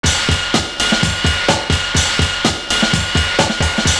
PLANE BEAT; Univers Sons

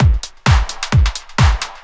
drum 130 techno; Techno Trance Essentials

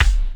TURBO KICK

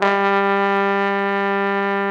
TROMBONE; Best Service Gigapack 1

